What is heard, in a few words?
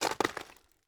Footsteps Icy Normal Single; Soundopolis; Icy Snow 2 HD